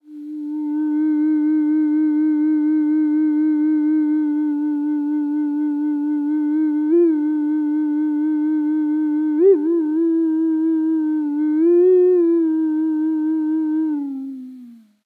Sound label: Theremin